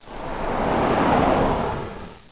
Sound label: CAR